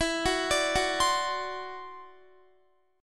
E 7th Suspended 2nd Sharp 5th E down Guitar 4F 4A 5C 5G 5C 6E; arpeggiated; chord; JGuitar